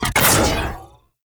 Arch Gun Alt Shot; Weapons; Warframe; Archwing; Hildryn

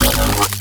Updated Shot, weapons; Tazicor; Warframe; Moa Pet

